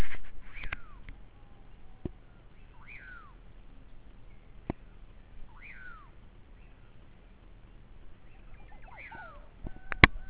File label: jungle